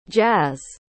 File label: jazz